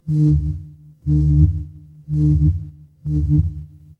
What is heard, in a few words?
fire electric hum